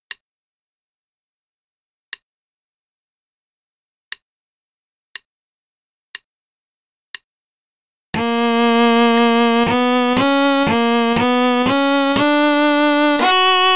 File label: Play-a-long Concerto in B minor, qn=60; Rieding; mm13-16